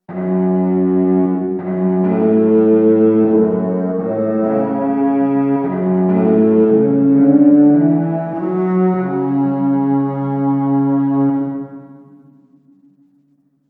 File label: cello; darkness within 2 the dark lineage